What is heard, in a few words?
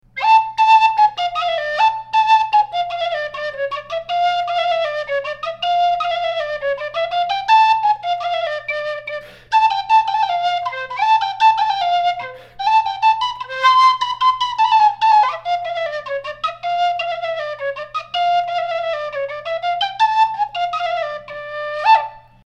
Soloveyko Flute – Ukrainian national pipe; handmade; Soloveiko Sopilka